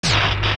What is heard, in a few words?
Shield; Barinade; zelda; OOT